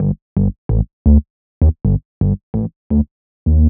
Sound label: Bass Loop 130 Gm; Bass Loops 130bpm; Downtown House